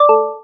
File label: klong; speech; tts